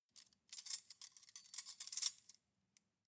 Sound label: cutlery cake forks handle